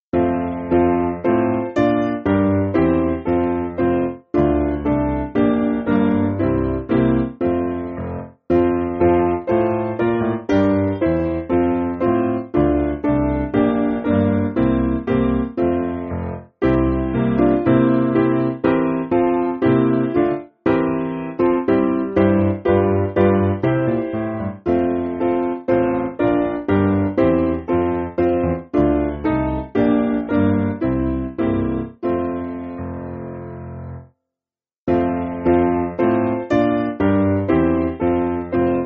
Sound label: Chosen Seed And Zions Lammets Folk SPiano